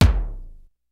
kicks snare, kid; Kid Nepro; Elite; KICKS SNARES

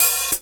HO, Casio CTK-5000

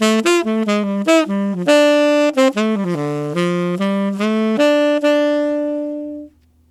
Tenor Country in D min, Tenor Sax Loops; Sonic Foundry (Sony Creative Software); Jazz Solos and Sections

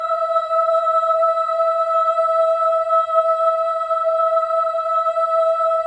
BOYS AHS, Symphony of Voices

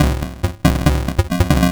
Crushed Perc (140BPM); musicradar

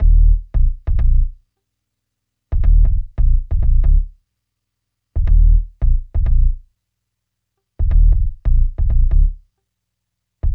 BSE, Hip Hop and R&B; Best Service ProSamples vol.01; AKAI

